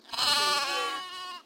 Lamb bleating; beanstalk audio

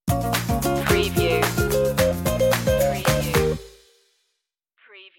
thumbs up island stinger

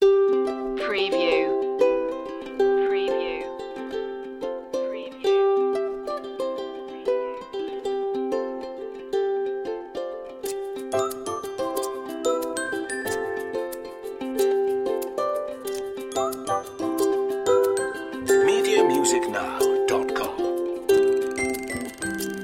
the toy box

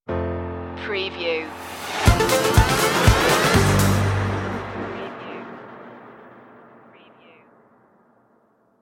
happy party stinger; media music now

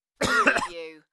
Male Cough; beanstalk audio

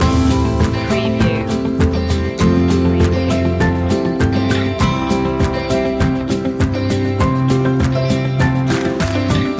walk together loop

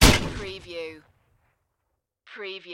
Grenade Distant

